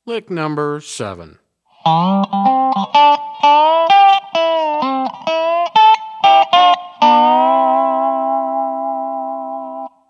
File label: resonator pedal, steel guitar